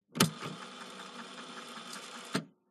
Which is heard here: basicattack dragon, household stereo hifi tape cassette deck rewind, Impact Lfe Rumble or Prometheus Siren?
household stereo hifi tape cassette deck rewind